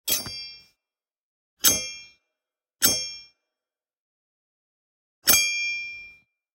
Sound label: Sword Sound